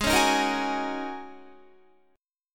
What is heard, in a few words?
Ab 7th Suspended 2nd Suspended 4th down Guitar; strummed